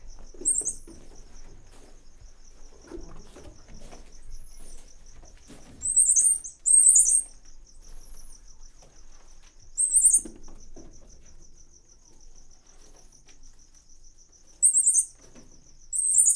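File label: last time, common squirrel monkey; Győri Zoo 2014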